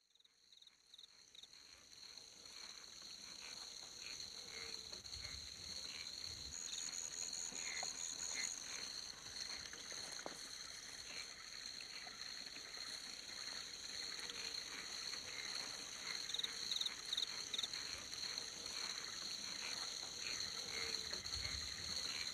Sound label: rainforest